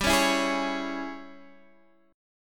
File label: G sharp Major 7th Suspended 4th Sharp 5th, G